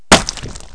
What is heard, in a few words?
spit; predator